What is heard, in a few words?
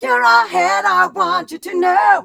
f mel c, fem Vocals